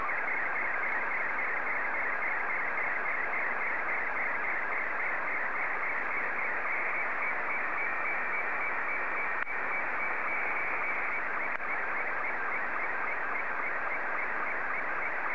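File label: Scottie 2, slow-scan television; VK2ODN; SSTV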